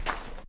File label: dirt footstep; minetest; capturetheflag